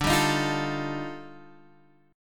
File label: Eb Major Sharp 11th D